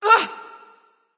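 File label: surprise; smite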